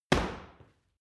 paw kick hard

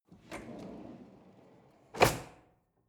drawer cutlery open draw hard

